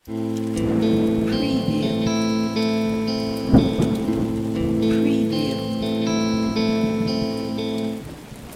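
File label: Arpeggio 4-4 Am Clean 120BPM, electric guitar; beatcraftpro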